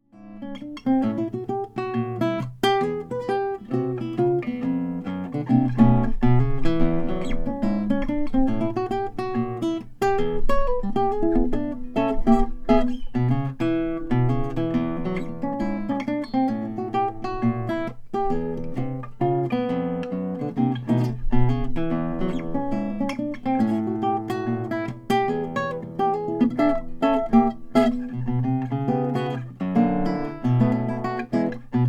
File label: 2008 maingard romantica old cocobolo